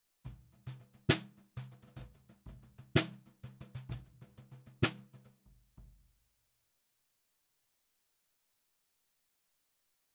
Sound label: Snare Bottom